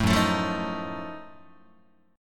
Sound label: Gb Minor Major 13th Gb down Guitar; strummed; JGuitar